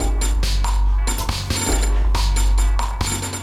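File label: Saver Loop, beats; Sonic Foundry (Sony Creative Software); Esoterik Beatz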